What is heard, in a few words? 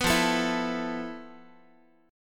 F Major 7th Flat 5th Guitar; strummed